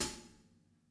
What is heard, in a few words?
HiHat, acoustic kit